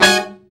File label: BRA SECT; BRA SECTIONS